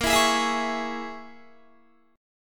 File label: A sharp Minor 7th Flat 5th A sharp, Guitar; 18,19,18,21,20,20; 4E,4A,5D,5G,5C,6F; strummed